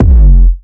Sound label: Kick Nasty, 808 Kicks; Slate; Vintage Drum Machines Volume 2 808 Edition